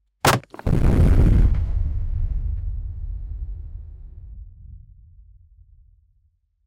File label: sound of impact car crash